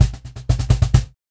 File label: Cajon Fill; percussion; musicradar